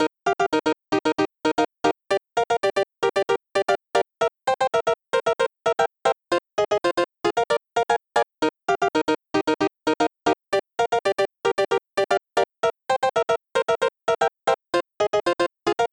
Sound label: light songs, instruments